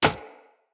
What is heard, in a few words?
Bow Impact Default; Weapon Impacts; Player; Bows; Environment; Envoy Hunter Bow